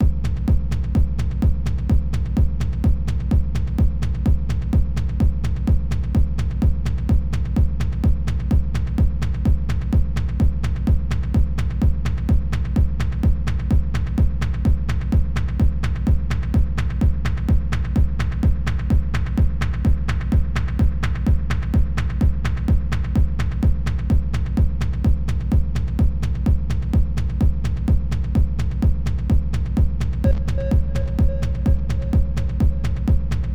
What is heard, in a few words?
Drumkit and filter